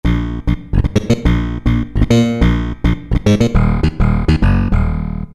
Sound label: Slap Bass, Roland PN-D50-02